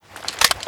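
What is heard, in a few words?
weapon foley famas reload lift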